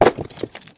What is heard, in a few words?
rock